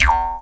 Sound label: E JAW, Primitive Instruments; Big Fish Audio - Didgeridoo and Other Primitive Instruments; JAW SIMPLE